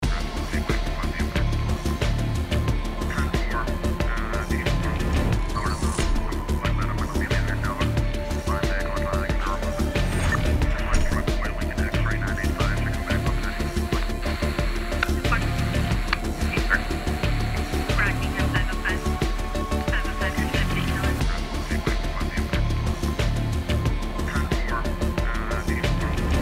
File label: menu music; masterkeyX; reklam